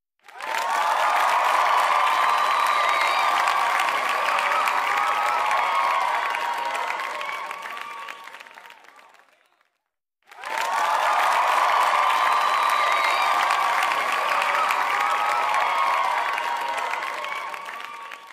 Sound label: Cheering sound effect